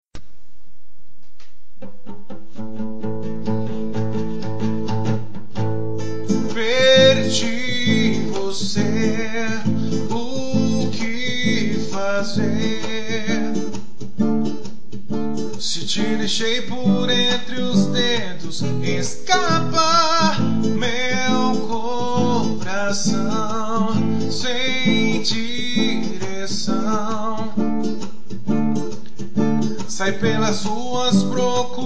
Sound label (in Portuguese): versão reggae